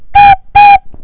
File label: toot